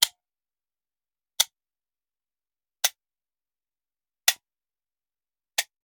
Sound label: Hand Gun Foley; Sound Ideas; Guns